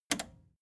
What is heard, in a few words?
record player repeat click fast